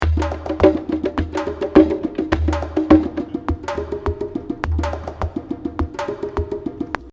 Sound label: bongo 104bpm; Bongos; Anything for a fan; Button Lady Project